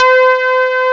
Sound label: POLY C5, SYNTHESIZER; GLUNGE